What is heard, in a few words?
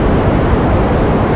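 explosion; starcrus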